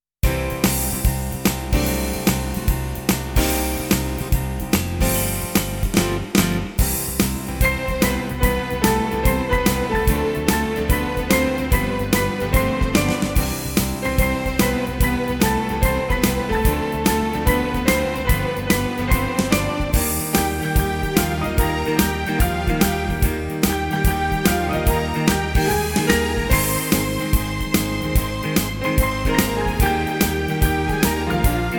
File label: jive, ballroom